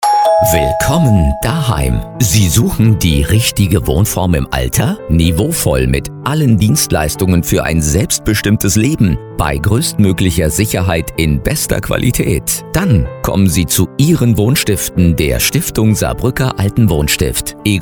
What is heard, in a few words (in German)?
Klingel; wohnstift-egon-reinert-haus